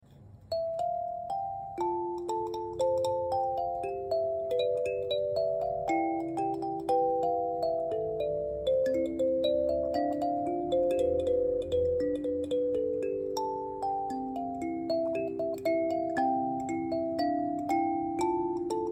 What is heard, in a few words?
kalimba